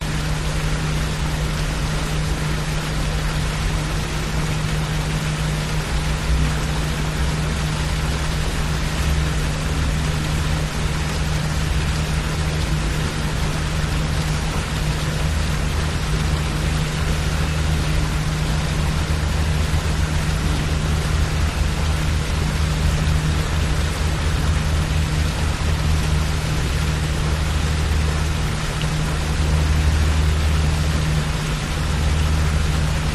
market dock sprinkler walla boats planes in background; vancouver; lonsdale quay; your free sounds